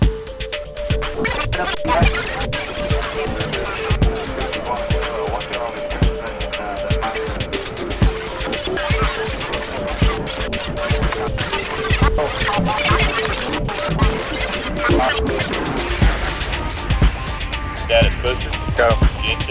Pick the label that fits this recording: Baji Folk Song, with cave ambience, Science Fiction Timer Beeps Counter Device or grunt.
with cave ambience